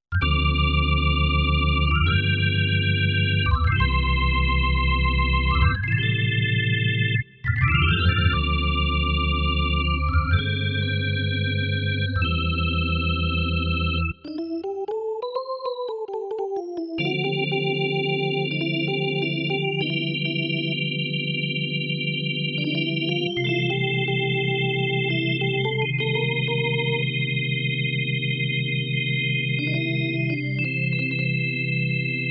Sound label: JAZZ ORGAN